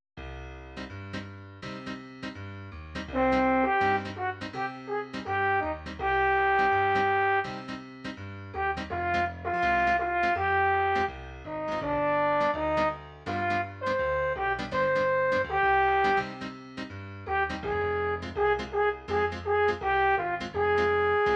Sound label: jazz